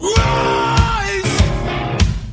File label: rise